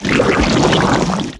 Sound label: neck puke; pestilence